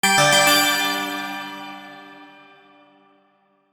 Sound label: Last Minigame Jingle